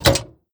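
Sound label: Elevator Lever Engage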